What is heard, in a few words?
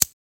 button; sonniss gdc